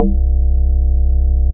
Big House Bass

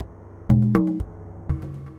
Two Sound Gater, Percussion; Playful Percussion

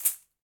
Caxixi Open; Shaker

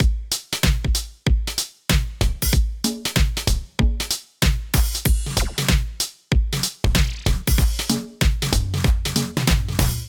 Foundation Dance Kit 123 Bpm, Drums; MIDI Clips; Ableton; Factory Packs; Vibrations; ModeAudio

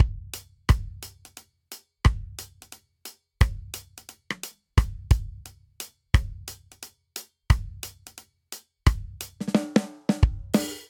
Swing Reggae Verse 88 bpm; 4 4; MIDI Clips; Ableton; Factory Packs; Drum Booth

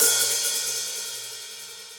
Hihat Open Cold Tight Room; Drum Hits; Standard Hits; Ableton; Factory Packs; Session Drums Studio

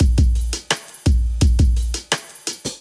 Kit groove 85 bpm; MIDI Clips; Kuddy; Ableton; Drum Essentials

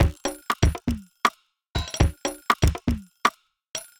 Toy Hits & Clock Kit; drum racks; Ableton; Factory Packs; Lost and Found

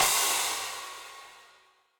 Crash FX17o14 Low Loud Squashed, Cymbal; Drums; Drum Hits; Standard Hits; Ableton; Factory Packs; Session Drums Club

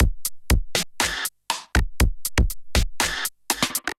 Cosine Kit, Drums Hybrid; Ableton; Factory Packs; Drum Essentials

